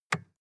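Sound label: sports car interior lightswitch dull; verkehr transport; autos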